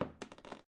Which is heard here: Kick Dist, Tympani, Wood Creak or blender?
Wood Creak